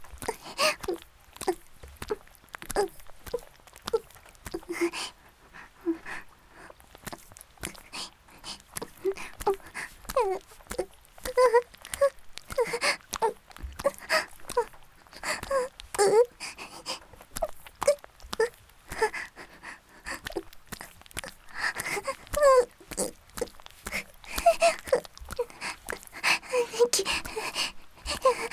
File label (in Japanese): 雨降り, ギシアンループ; 雨降りバージョン; あ～にき！いくら出す?